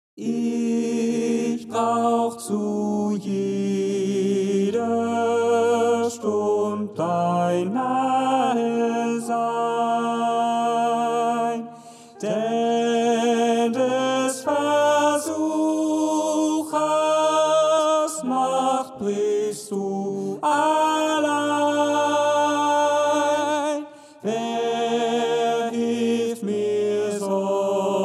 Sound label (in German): Bleib bei mir herr Tenor; Seid fröhlich in der Hoffnung